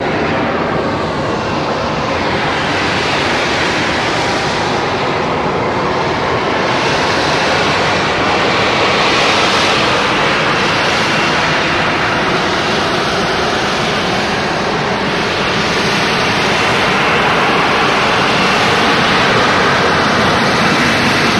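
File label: Harrier In And Lands With Wind Down, jet; av-8b harrier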